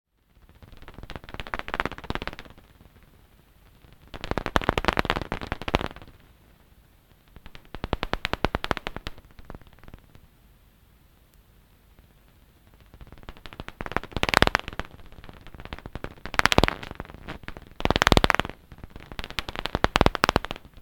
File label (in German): Werberuf braunes Langohr